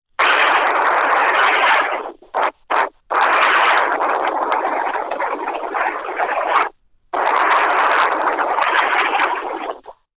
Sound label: human telephone person blowing into phone recorded speaker